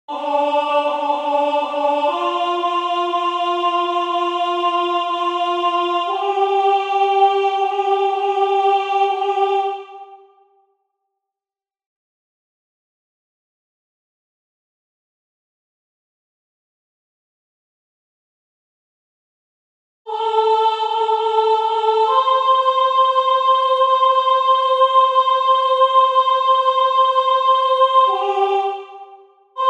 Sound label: Messe solennelle Et Expecto Soprano; Gounod